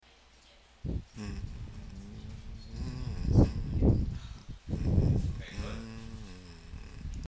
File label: Dududu